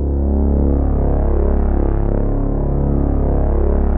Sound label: Prophet 5, D2; Hollywood Edge - Giorgio Moroder Rare Synthesizer Collection